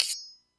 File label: machete vs spatula